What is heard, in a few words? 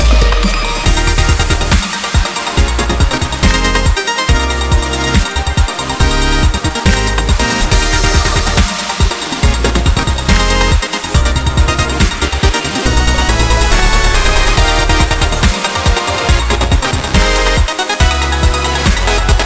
bigWinLoop; jewel fruits